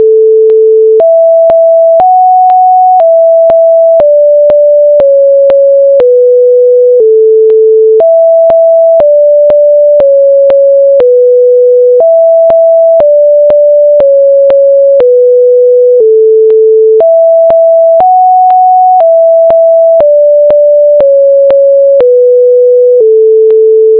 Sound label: song